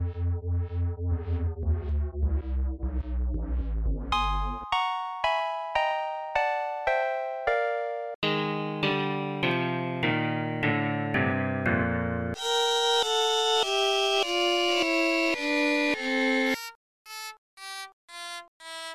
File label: F Major Scale